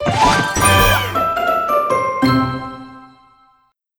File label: Crunchy Finish